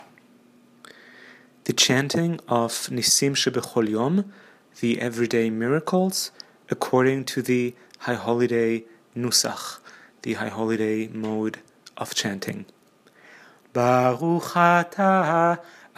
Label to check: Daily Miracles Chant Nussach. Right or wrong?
Right